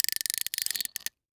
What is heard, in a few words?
Fishing Pole Cranked Slowly Short; Sports Pole